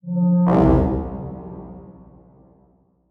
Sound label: Title Transition Logo; Adobe; Production Element